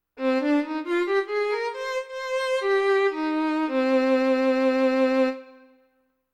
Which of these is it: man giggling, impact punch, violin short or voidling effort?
violin short